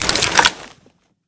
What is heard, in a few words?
m249 draw